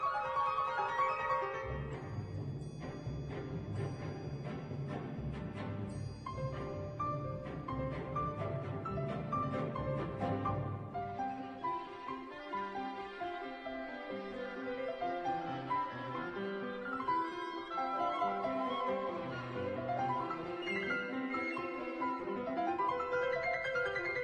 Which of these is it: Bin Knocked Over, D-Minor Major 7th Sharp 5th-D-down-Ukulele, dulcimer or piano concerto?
piano concerto